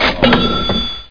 Money; iPoker 2000

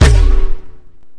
Exclamation; BroodWar

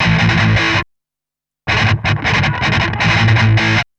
riff

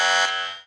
ERROR; PICKUP EXPRESS

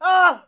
plyr ht; quake; halo; hurt

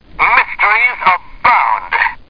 tick

